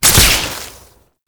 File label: Alt Fire Shot; Weapons; Warframe; Tenno; Oraxia Flechette